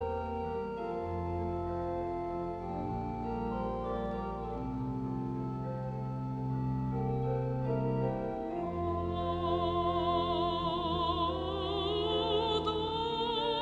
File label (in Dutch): Koor gemengd